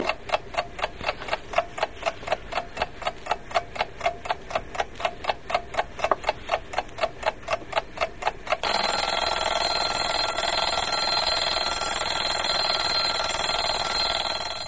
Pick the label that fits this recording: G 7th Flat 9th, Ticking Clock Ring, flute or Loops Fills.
Ticking Clock Ring